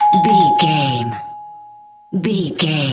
doorbell ring double

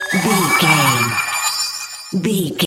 magic device transform

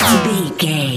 sci-fi weapon blaster laser fun

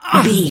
voice male hurt pain

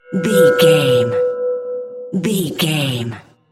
magic mirror spell tones

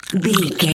zombie eating chewing

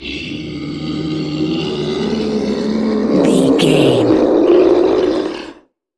troll monster growl slow

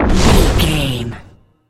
bullet leave barrel slow

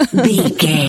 voice female laugh

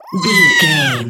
goblin fairy battle shout

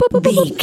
voice fun character vocal crazy

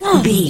voice male attack

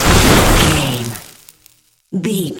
electric lightning blast

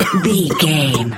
voice male coughing

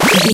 retro powerup collect

